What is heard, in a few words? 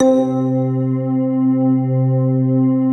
block pads; Pro-Rec - Synthsonic